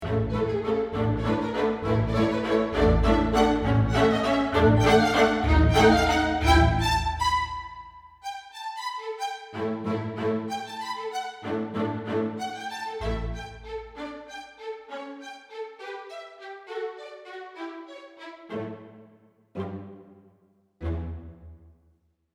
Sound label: Spiccato Strings XXL